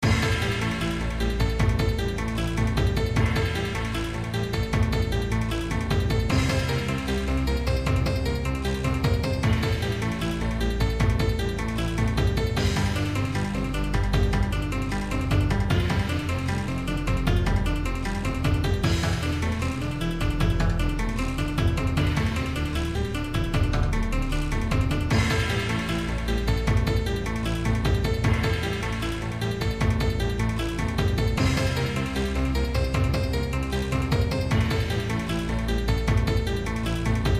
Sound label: electric techno remix; Super Fighter